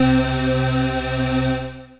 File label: Pad